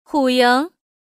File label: tiger